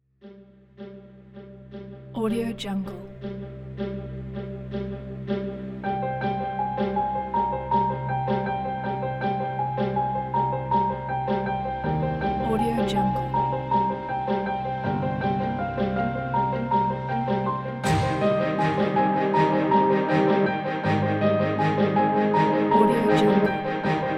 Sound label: cinematic dark trailer